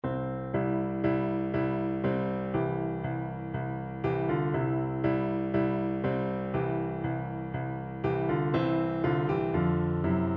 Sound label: vivaldi spring, piano duet